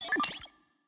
Calc Scribble Holo; blue archive

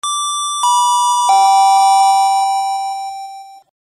KTX announce bell, Train Announce

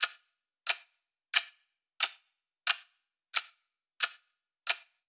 Clock; blue archive

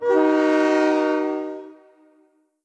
Horn; blue archive

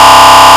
horn, sirens; federal signal eq2b

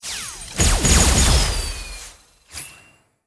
UI Character Growth; Three Shin; blue archive

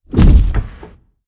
Foot Step; blue archive

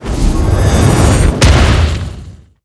Heavy Tank Cannon; blue archive

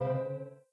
UI Tile Boss Appear; Conquest; blue archive

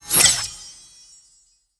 Teleport; blue archive

